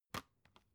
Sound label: dvd case open